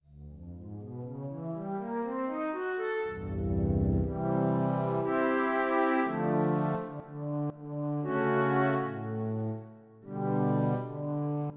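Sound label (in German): Querflöte; Papelmedia; Flute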